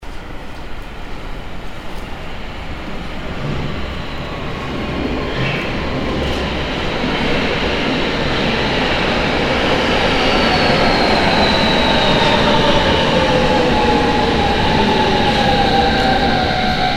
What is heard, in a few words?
subway arrival